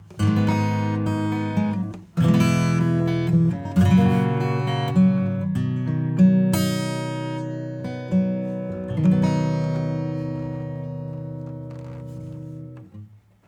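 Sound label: Acoustic Rhythm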